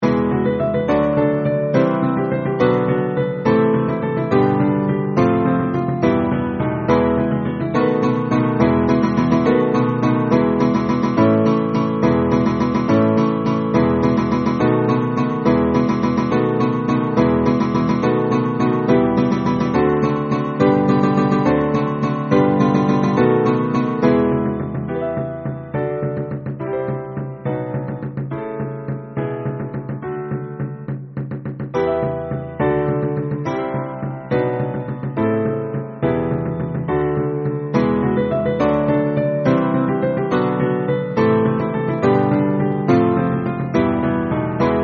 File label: TuttiInstrumental; Los Pastores